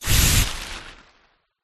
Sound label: Fire Spell Spitfire; sound spark